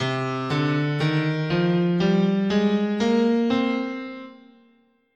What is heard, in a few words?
C Aeolian, scale